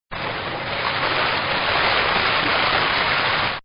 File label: Surf; Holiday Sound Effects; recorded in a custom built sound booth